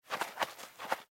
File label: Snow Idol; Blind Quest - The Frost Demon